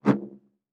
Science Fiction Sci-Fi Saber Light Saber Whoosh Short